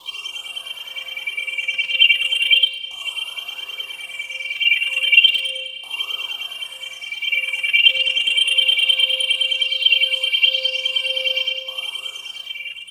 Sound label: Soundscape; FX